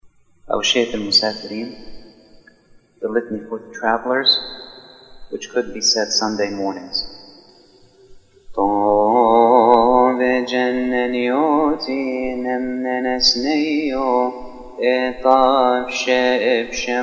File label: traveler coptic; Altar Responses